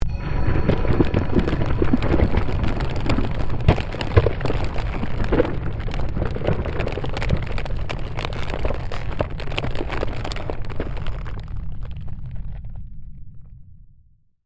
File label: Created Magic Spell Glacier Quake; Series of created spell sounds